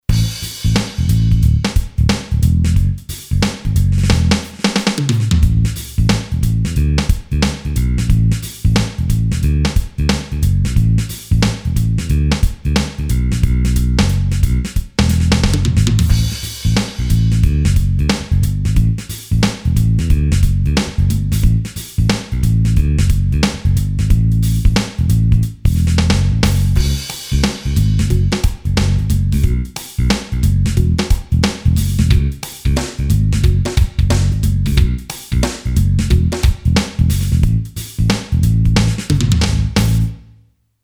FUNK, Boss DR-3

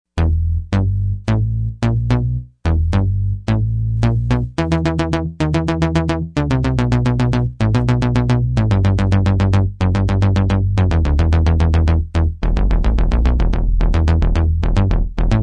SynBadBass, Yamaha TG55; Factory Presets

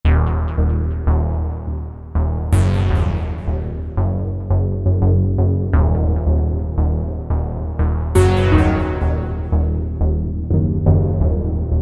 Sus4, Roland V-Synth; Factory Patches

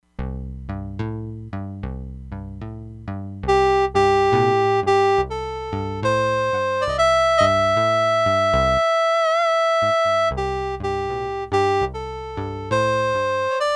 GUITAR - HARMONICA, Crumar Bit 99; Factory Programs

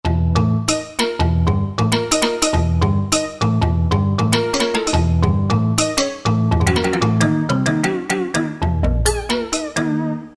Ethno Tap, Roland JD-990; Factory Patches

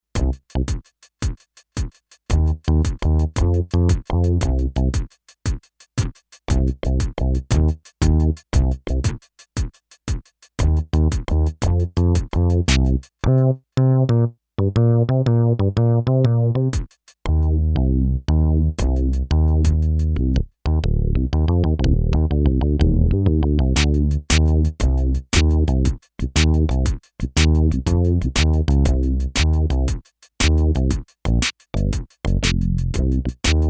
Drm & Bass, Kawai K5000S

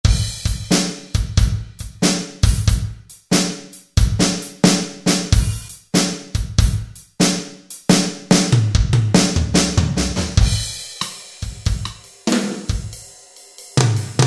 KIT - Slow Shuffle; Northstar Productions Drumscapes Vol.1

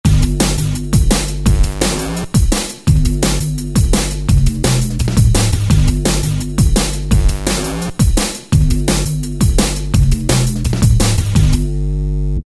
Darkside, Korg ES-1; Factory Patterns

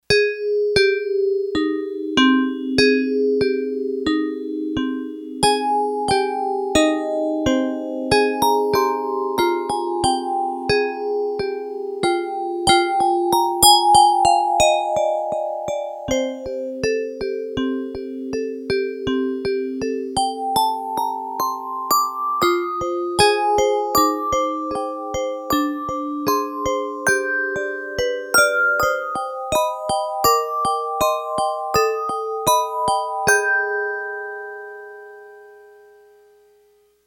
Celeste, Yamaha TX802; Factory Patches; Voices

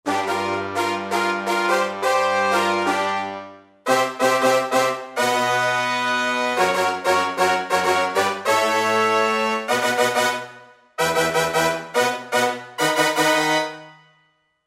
Brass Mix, Roland JD-990; Factory Patches

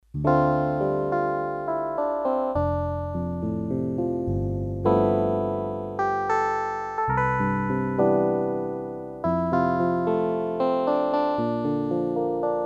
Piano, Korg DS-8; Factory Patches

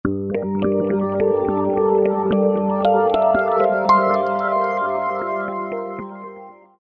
Coltish EP, Roland V-Synth; Factory Patches

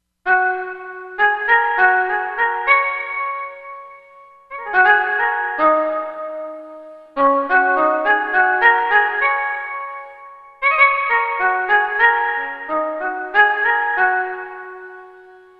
Shou Voice, Korg MSC-13S Ethnic 2

